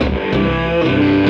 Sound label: Chk Curl, Electric Guitar; Sonic Foundry (Sony Creative Software) - Friendly Fire